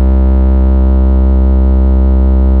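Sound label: electric organ 3 C4, Fairlight CMI IIe, keyboards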